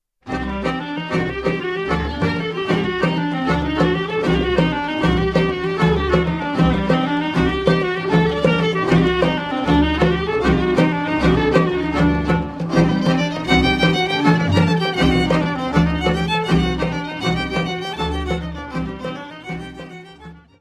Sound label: Horo; Bulgarie